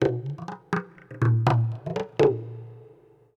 PERC LOOP ETHNO 125; eJay

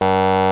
error

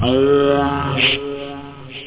noise, speech; da capo vol1

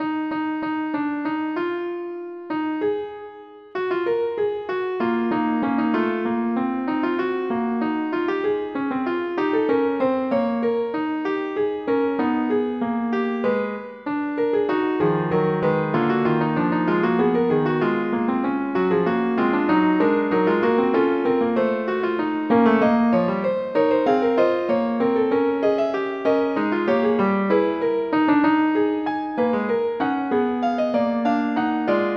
fugue

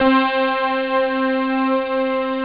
ping, synth

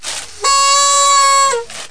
Party Horn (Single Blast); How Many Bugs in a Box

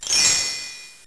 Page Turn; Treasure Galaxy (1994); Learning Company

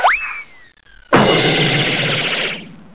Whee Crash; DR BRAIN; BUGS

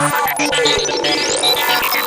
trickle

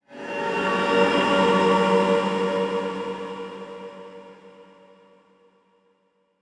industry b4; PC Gamer IT CD 40; SMACDEMO

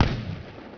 AA Gun Fire; shadez

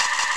seagulls; Fishing Flash Cards

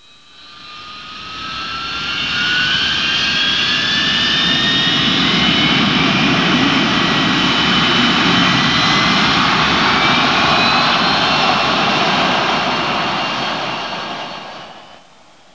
jet roar, aviation

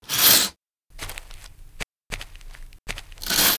shower Exit; Singles Flirt Up Your Life

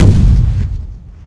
Large Cannon; WarZone 2100

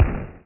Apache Shot; shadez

